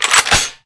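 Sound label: weapon pickup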